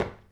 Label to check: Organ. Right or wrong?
Wrong